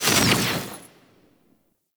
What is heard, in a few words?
Proj Explosion; Warframe; Noctua; Weapons